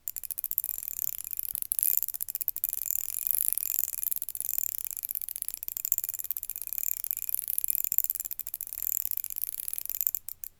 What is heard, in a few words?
Ratchet Small Spin; Matco